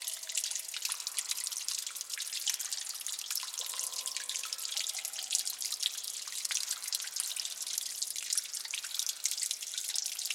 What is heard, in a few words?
birds